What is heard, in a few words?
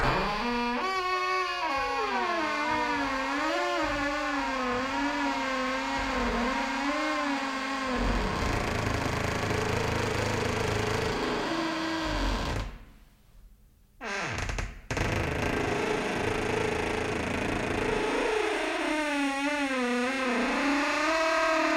door